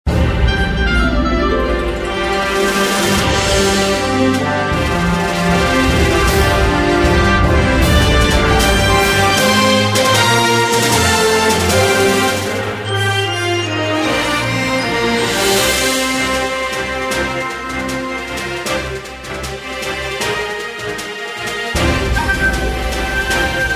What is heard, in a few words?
Battle BGM